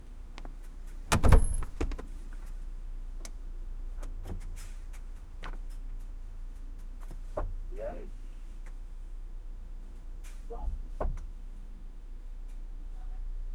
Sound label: car mechanic car repair